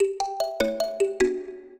minuet, mbira; Mozart

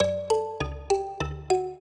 trio, mbira; Mozart

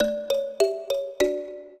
minuet, mbira; Mozart

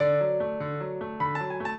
piano; Mozart; minuet

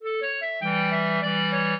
clarinet; Mozart

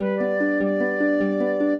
minuet, flute harp; Mozart

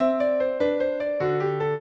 piano; Mozart

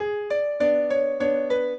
minuet, piano; Mozart

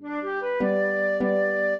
trio, flute harp; Mozart

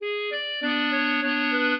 clarinet; Mozart

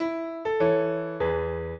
minuet, piano; Mozart

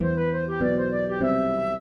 trio, flute harp; Mozart

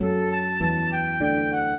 minuet, flute harp; Mozart

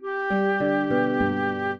trio, flute harp; Mozart

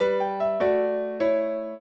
trio, piano; Mozart

